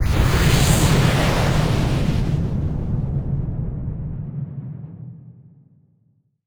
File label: wind magic large; 魔法/法术的音效